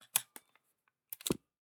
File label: AR Button Gun Foley; Nova Sound